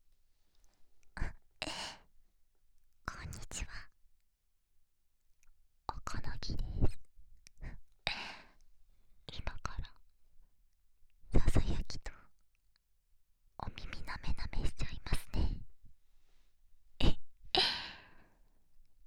耳舐め, ASMR

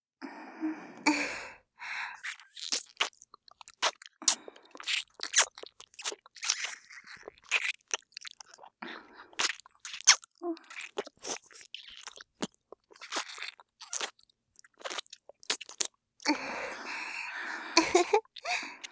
ひたすら キス; L名月R由真; おまけ5（由真×名月）; 左右反転版

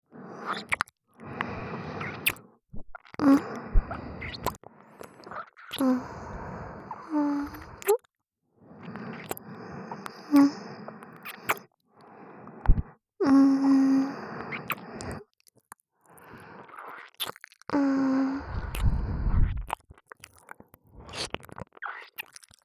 耳キス

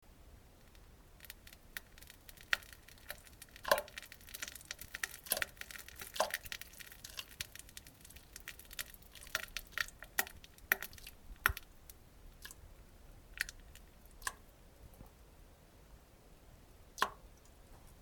ちょっと やわめ ふつう うんこ むちょちょ ちゃ 音

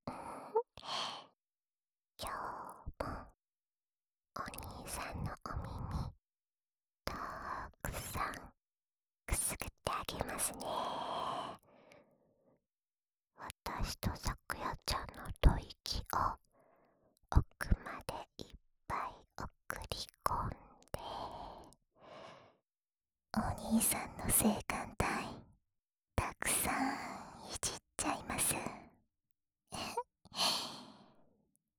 安眠用お耳ふーふーループ, ASMR

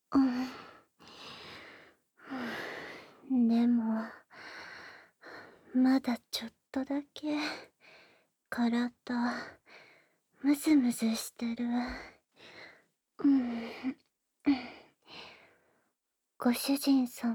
耳舐め; 耳で感じちゃうド変態ご主人様はレイがお仕置きしてあげます♪

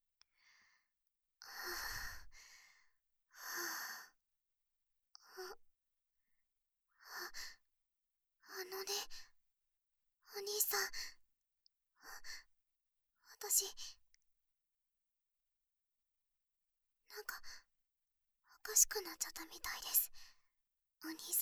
反転 左耳舐めパート; 左右反転

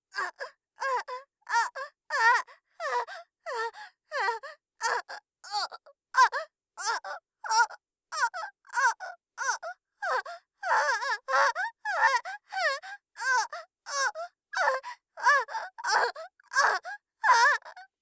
"あ゛っ"; 効果音なし

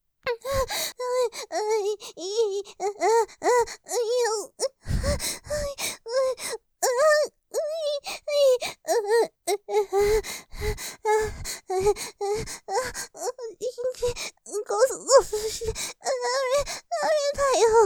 喘ぎ 吐息多めに; おちんちんこすこす→とろとろに; モノラル; ループで楽しむ！シェフの気まぐれ抜き抜きコース

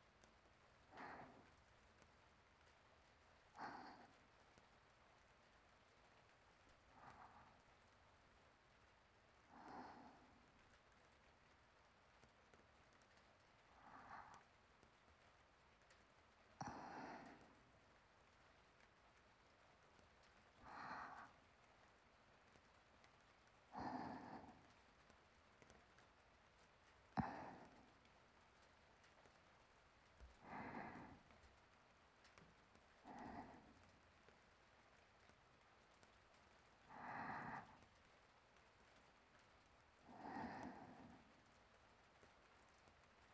寝息ループ; しろがね

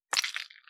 射精音付属音

